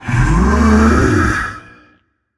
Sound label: breath, voicepack; smite; jorm